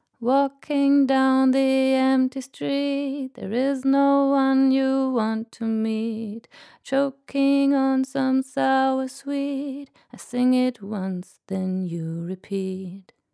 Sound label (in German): Vocal ohne Comp.Two